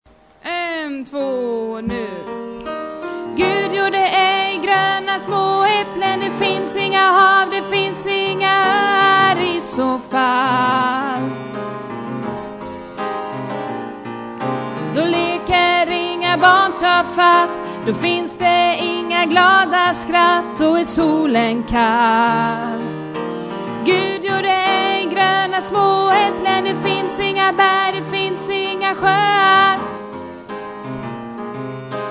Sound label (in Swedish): sopran